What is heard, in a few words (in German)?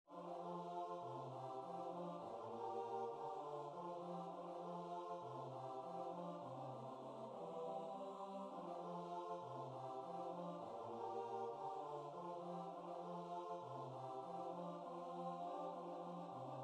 CHOR; Milo mu Kokkino